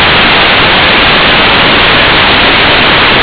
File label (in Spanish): ruido